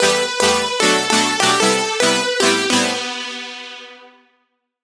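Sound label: synth loop turnaround 150 E minor; Virtual Riot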